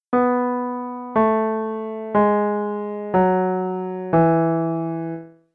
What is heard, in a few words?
DOWN Bass E maj